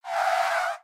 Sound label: Slide In; Char Create